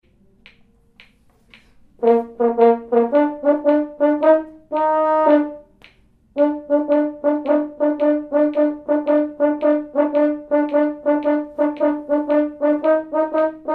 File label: Horn; Bayside